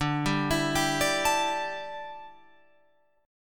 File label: D Suspended 2nd Suspended 4th D down Guitar Open F; arpeggiated